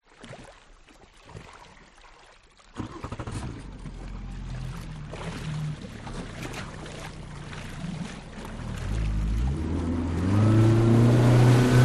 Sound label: transport motorboat outboard start away fast onboard